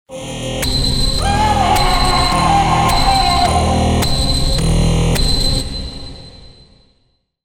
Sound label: terror alarm ring